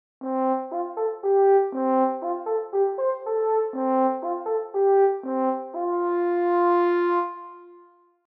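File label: French Horn Wet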